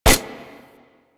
Fire Mech, Weapons; Latron Prime

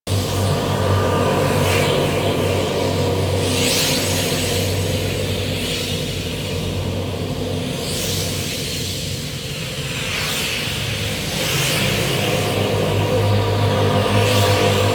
Magic Mist Loop; Ambience; Props; Warframe; The New War; Zariman; Quests

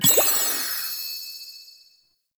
Vendor UI Open; Warframe; Nokko Colony; Vendors

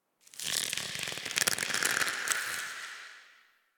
Tendon Stretch Medium Echo; Ambience; Misc Outdoor; Warframe; Infested Eris

